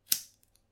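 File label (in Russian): звук щелчка зажигалки несколько маленьких искр на хвосте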